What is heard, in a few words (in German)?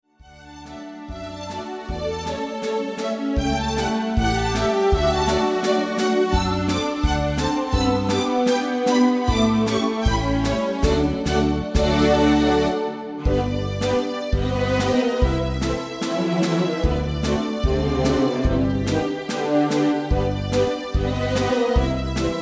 Polka; Annen